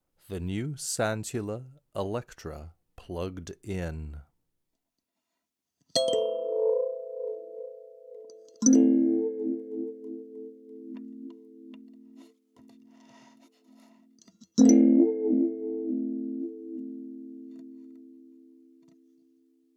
Sansula Elektra DI

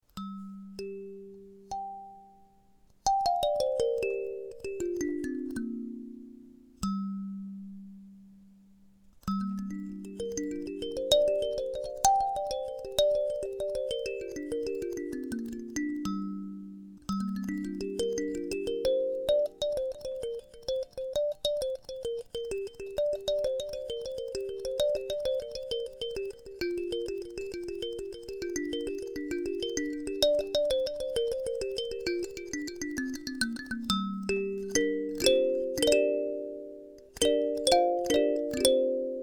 Cel Penta Gm, kalimba

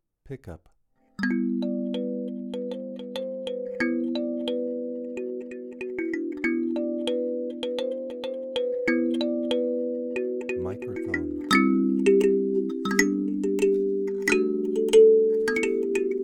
Alto, kalimba

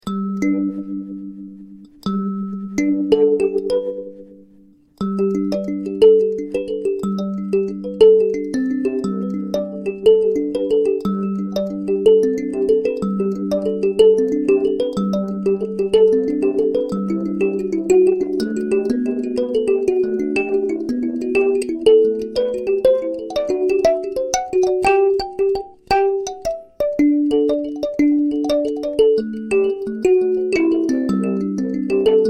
Cel Alto Bowl, kalimba

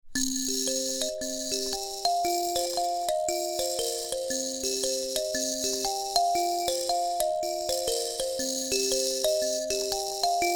Lotus Buzz, kalimba

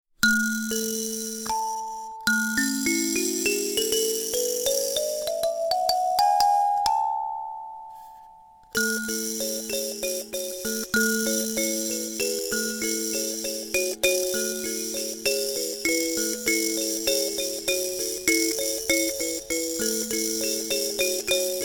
Karimba African

